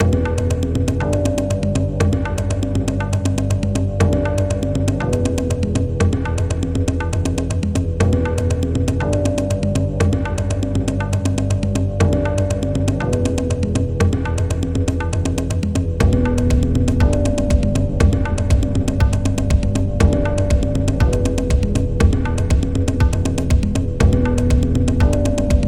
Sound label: Combat; Serious Sam